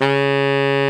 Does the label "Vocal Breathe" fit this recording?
No